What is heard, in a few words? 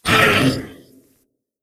npc draugr aware